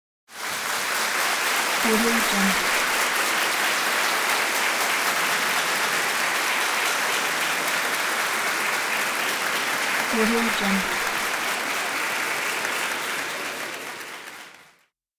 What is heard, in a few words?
applause